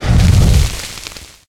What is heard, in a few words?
fire start; catnap dragon; godzillark